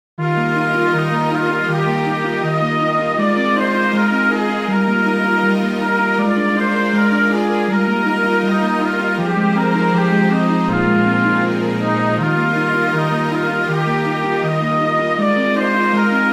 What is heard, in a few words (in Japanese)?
空を泳ぐこいのぼり